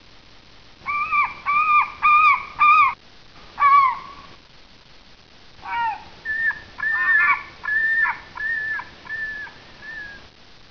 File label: seagull, ambience, animals; cstrike; sadpuppy